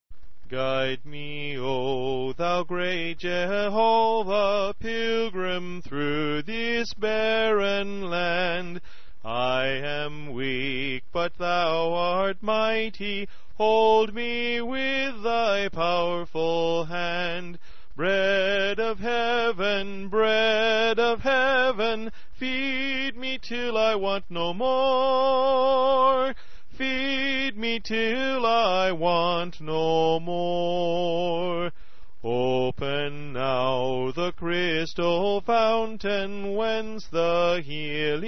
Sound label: Guide Me O Thou Great Jehovah Soprano